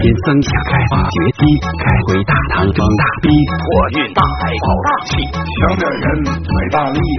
bgm

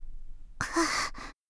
Voice Breathing

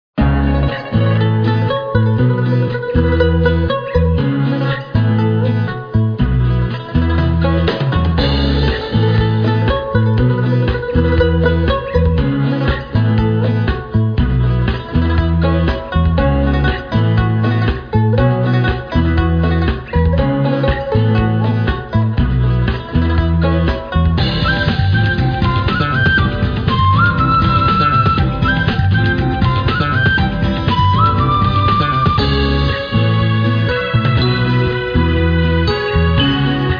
city

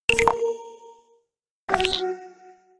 button

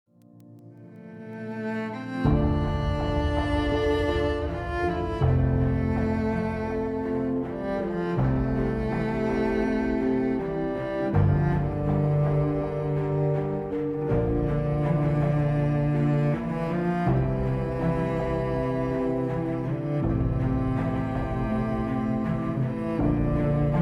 BGM

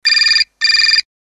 ring

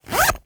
zipper

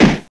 Blow

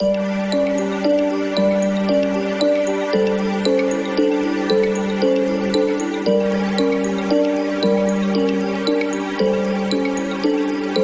Bgm Fantasy

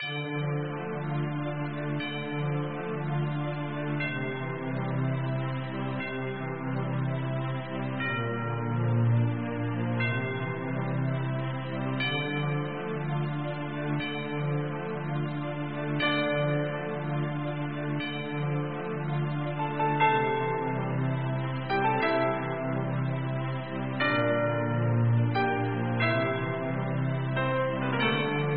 Ending

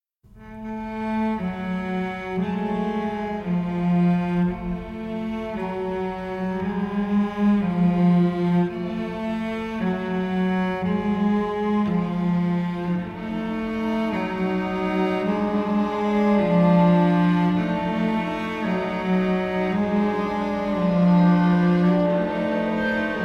bgm

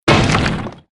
pickaxe

